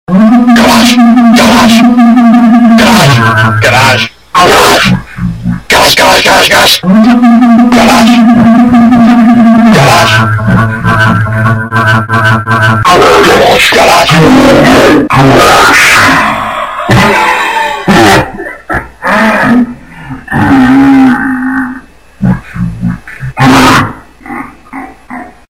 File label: symphonic garage